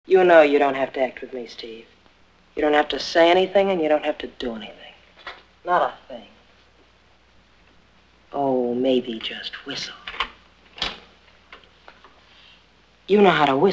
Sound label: whistle